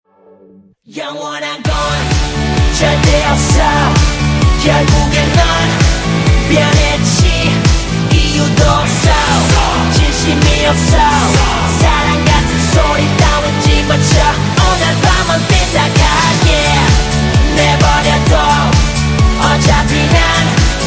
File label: chorus